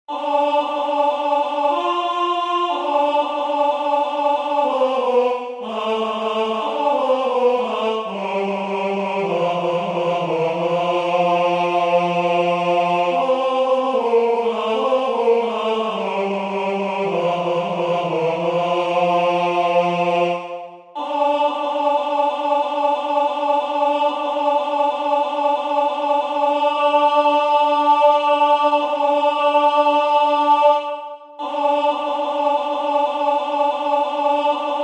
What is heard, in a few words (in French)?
tenor